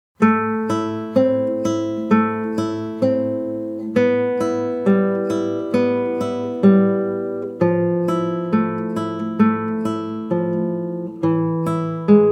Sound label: In a Flamenco style, guitarra